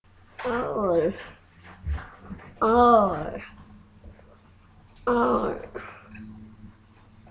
owl

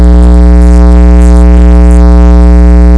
scratch

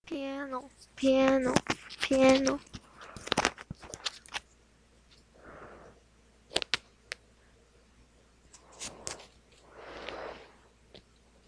piano

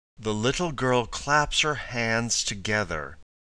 clap

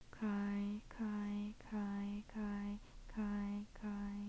cry

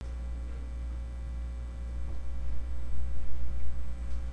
noise

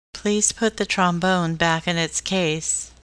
trombone

